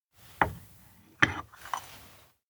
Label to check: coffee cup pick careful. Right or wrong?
Right